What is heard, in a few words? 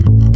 Upright Bass Notes